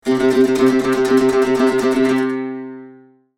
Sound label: Dulcimer tremolo medium